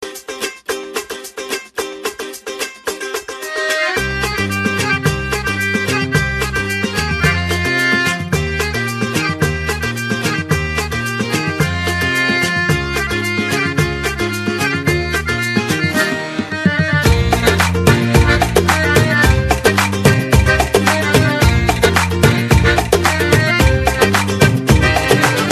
lovely music

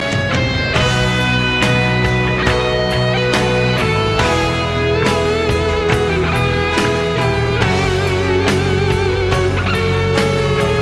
solo; godfather

